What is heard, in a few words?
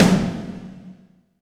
Snare, Alesis DM5 Drum Machines